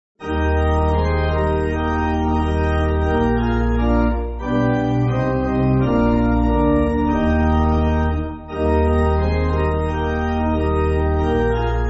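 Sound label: Pipe, Music Backing Files for Churches; Thou Art At Fathers; Danish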